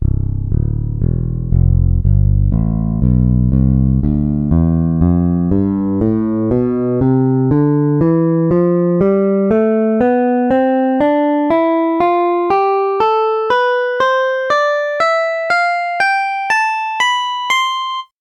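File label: fingered bass scale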